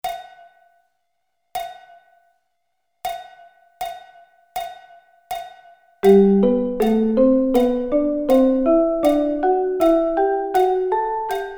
Thirds; G

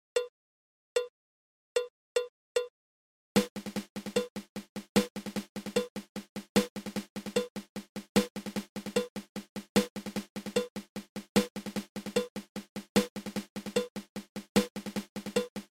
Drag Paradiddle

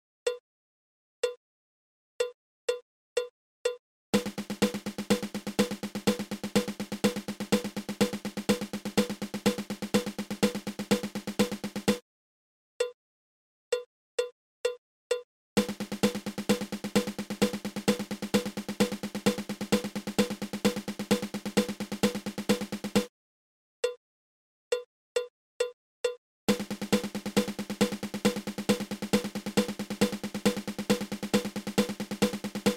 Single Paradiddle

